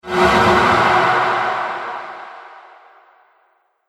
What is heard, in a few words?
Epic Sf Explode Impact; Sci Fi Sound Effects